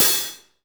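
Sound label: hi-hat open; REMIX 3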